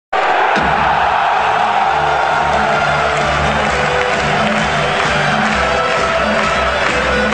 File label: Hockey Goal; Aik